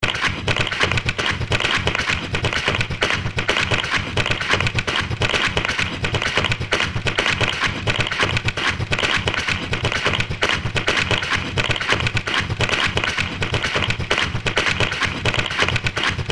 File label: jingle